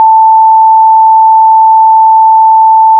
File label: bell sounds